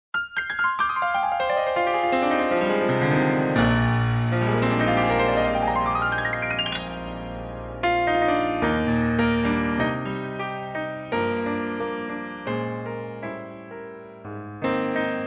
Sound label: White Grand